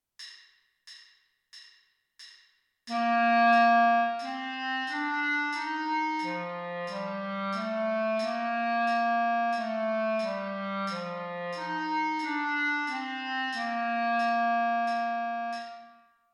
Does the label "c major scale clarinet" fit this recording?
Yes